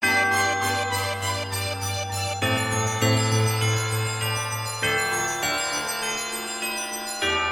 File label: Evil Sms